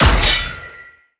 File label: ui battle fight button